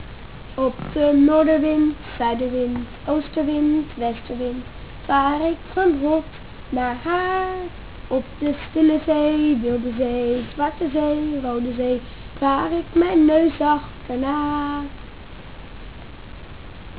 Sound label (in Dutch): Refrein; Zeemansliedje